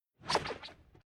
Whoosh Sweep By Spatular Whip Spin Low Pitch Left To Right